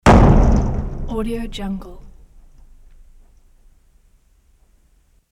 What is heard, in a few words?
deck of ship heavy; hollywoodedge